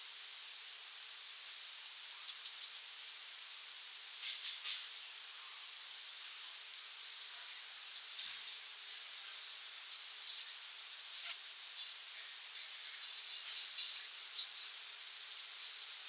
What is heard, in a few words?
divebomb